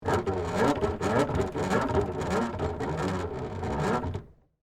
Hand Crank Voltage, Hand, Crank, Low, Voltage, Device; Foley; Miscellaneous Electronic